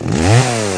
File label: saw up